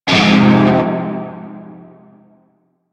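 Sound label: Shawzin Minor Chord G; Music; Warframe; Narmer Shawzin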